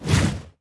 wrath flame; veznan

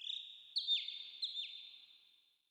terrain Ambient birds

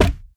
gator melee; crocs

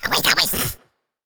witch outside curse

